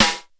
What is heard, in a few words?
SNARE, DRUMS; Crypton - DrumBase5000; T1 DRUMS